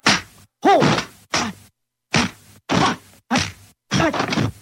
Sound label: martial arts